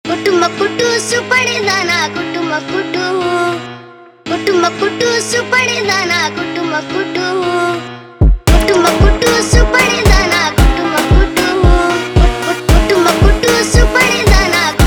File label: Funk slowed